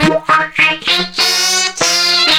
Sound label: Horn Sect Effect